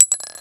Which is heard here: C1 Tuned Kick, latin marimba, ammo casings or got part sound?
ammo casings